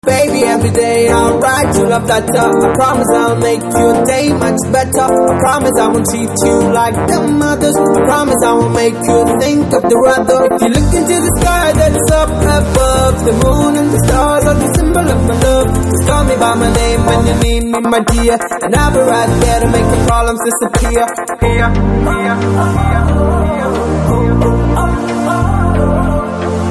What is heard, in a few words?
Ringtone